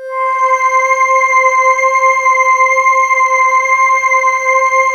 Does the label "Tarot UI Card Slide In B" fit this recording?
No